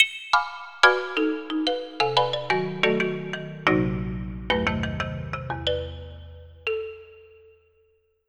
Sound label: marimba; fuel efficiency via marimba